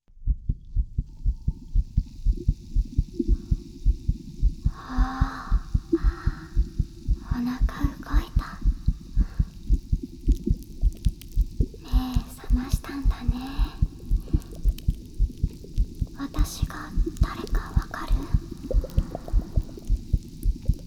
心音・炭酸・ささやき; ようこそ、ママの子宮へ

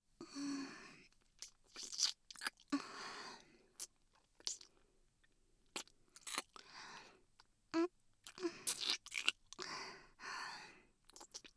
キス; SEなし

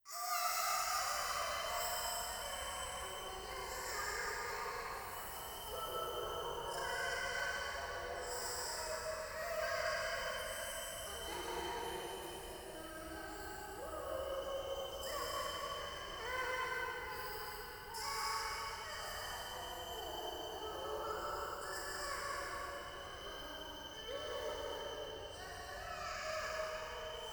ゴースト シャブ喘ぎ 山奥

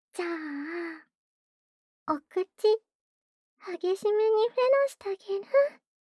無垢 喉奥口フェラ, ASMR; フェラ素材

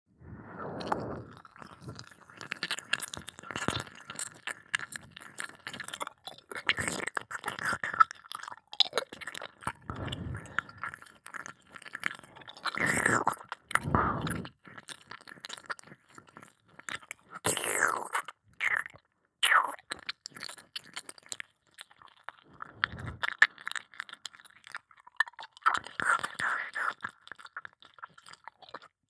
耳舐め・激しい・左耳

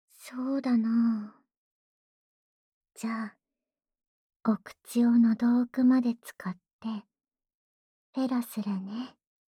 ダウナー 喉奥口フェラ; フェラ素材

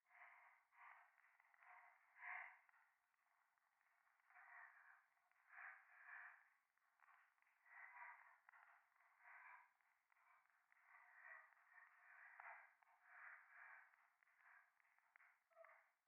左耳 快感 吐息 暖炉; 夢の中

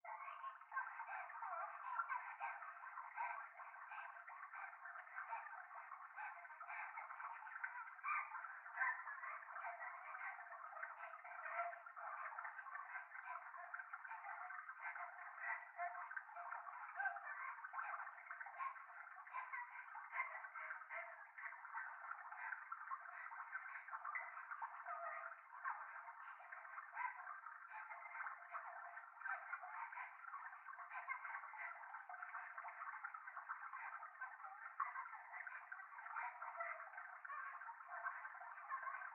トイレ 可愛い喘ぎ 川のせせらぎ

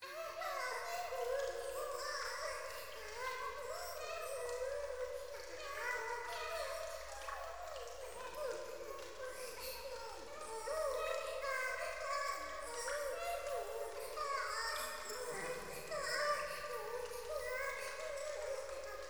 可愛い 喘ぎ 雨上がり

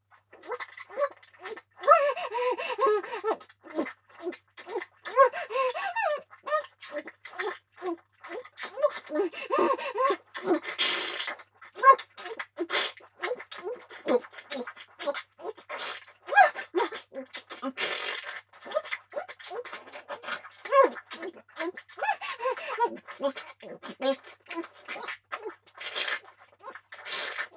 くわえ込むフェラ（喘ぎながら）; ポーラ（妹）

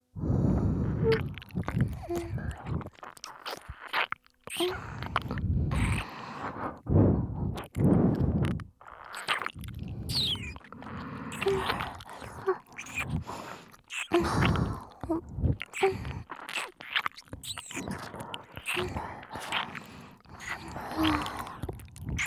両耳舐めループ素材, ASMR

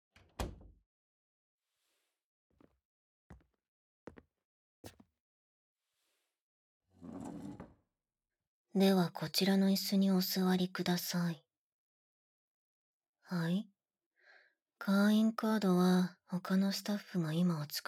耳舐め; 会員様には特別なお掃除サービス？！福耳舐め舐め運気爆上がり特典！！

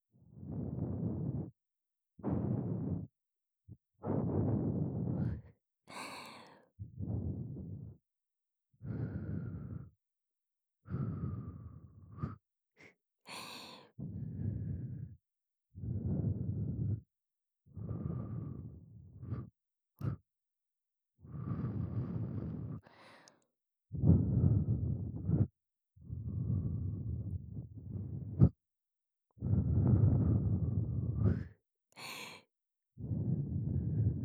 吐息(左耳)

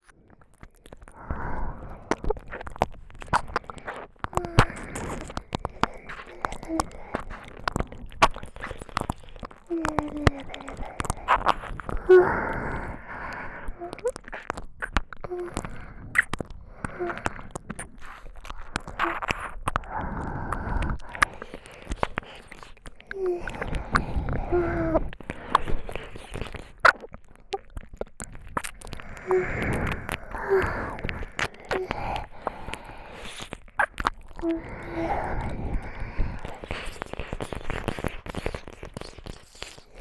やらかい舌でぺろぺろ＆吸い付き舐め; 両耳Ver

